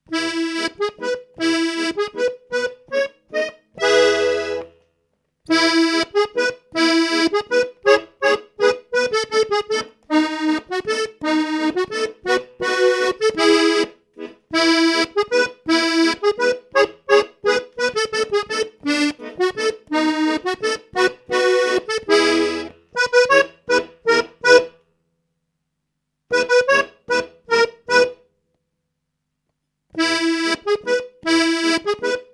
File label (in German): Holzschuhwalzer